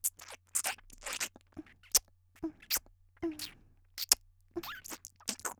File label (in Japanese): 「フェラ」キスしながら5秒; 綾波系少女